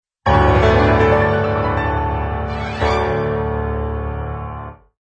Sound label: Piano Logo Reveal